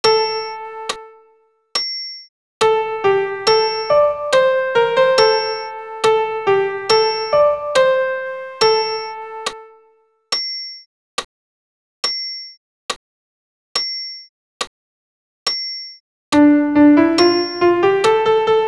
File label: Gloria Amen sop pian seul